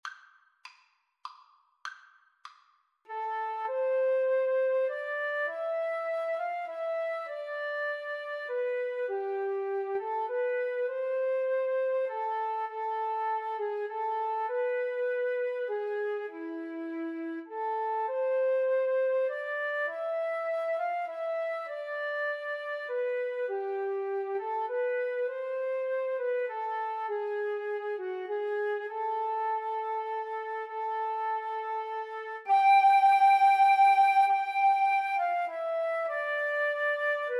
greensleeves, Guitar-Flute Duet

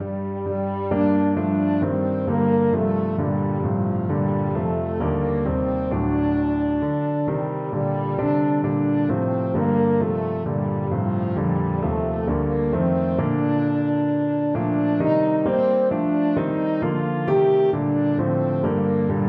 god rest, french horn

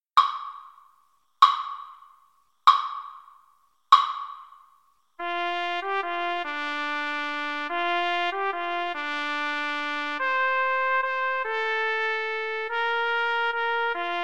Trumpet Trombone Duet

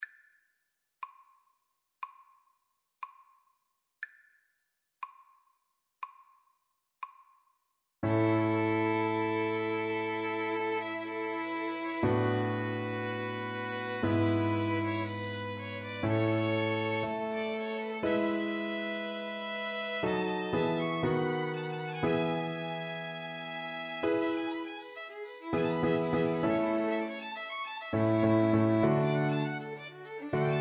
adagio and allegro in a major

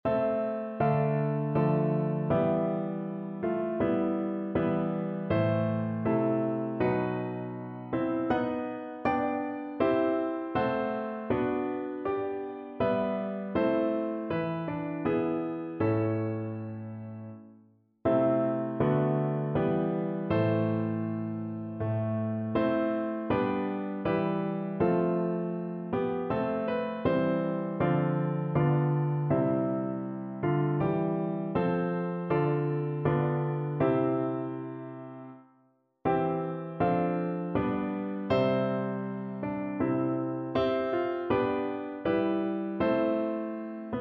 o nata lux, piano

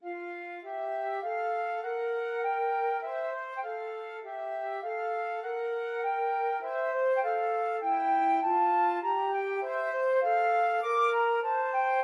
Menuett in C Mozart flute duet

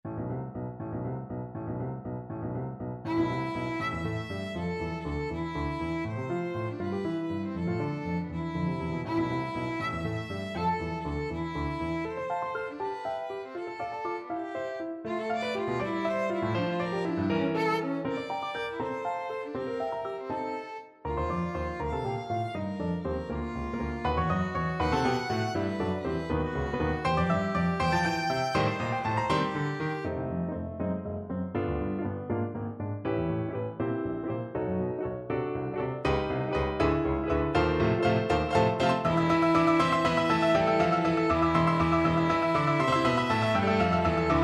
scherzo violin; bruckner sym7

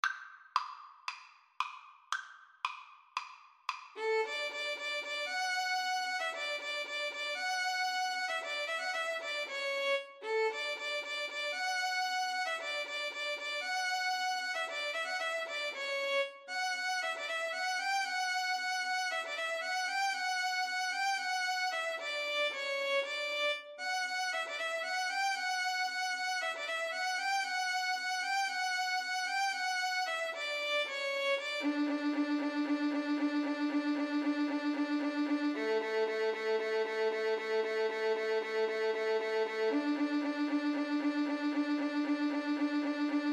spring longer violin duet

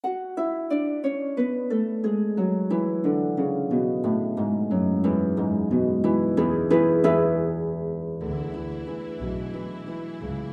lake wal, Viola

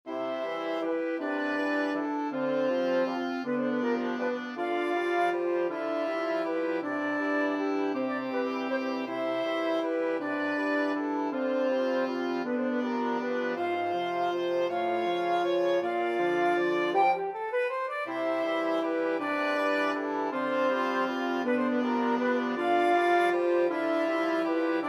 flower waltz wind

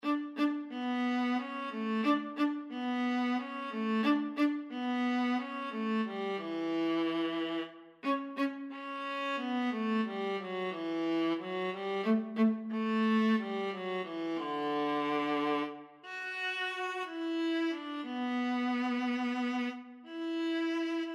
viola

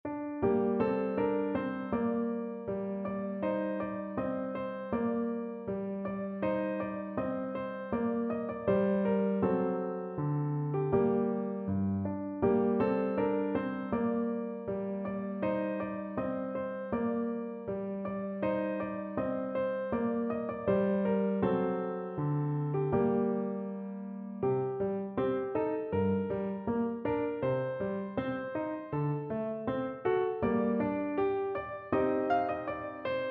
old french song, piano

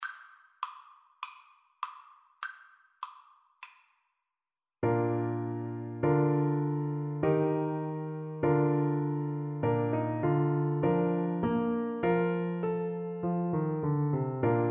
shove that pigs foot, Flute

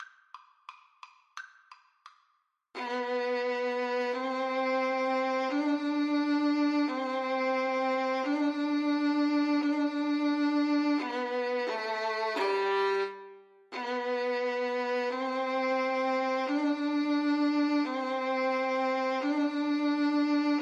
Violin Duet

